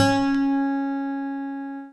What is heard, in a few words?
lyra; theodyssey